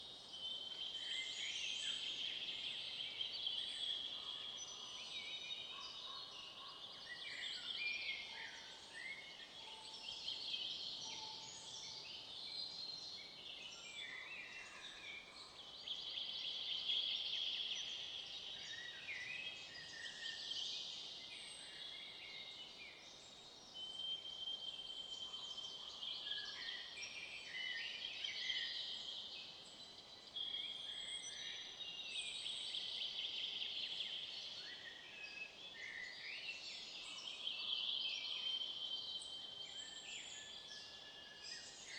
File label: jungle, ambience; ambient; minecraft; Remastered